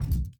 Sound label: Hand Paddle Attack Med